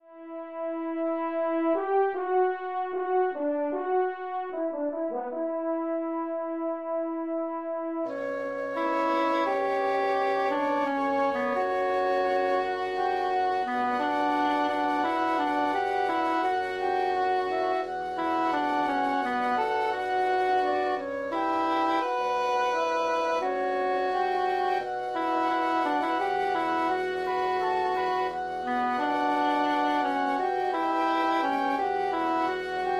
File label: Wind Ensemble